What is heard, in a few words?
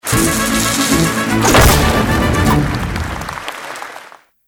outro; Lucha Rumble